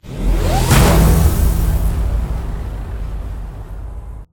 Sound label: Transition; Everspace 2